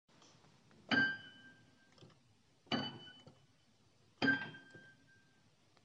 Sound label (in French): demi ton